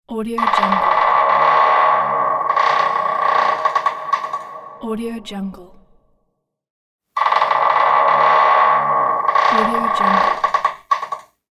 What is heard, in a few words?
creaking door sound; catsoundstudio